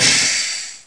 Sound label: ROBO DOOR REL; BANZAI